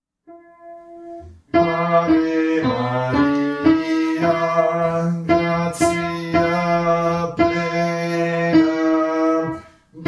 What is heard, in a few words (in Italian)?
contralti; Ave Maria Arcadelt